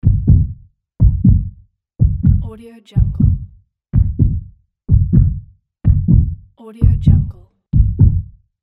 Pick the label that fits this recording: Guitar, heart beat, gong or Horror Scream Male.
heart beat